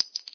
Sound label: pl shell; mistake coop; player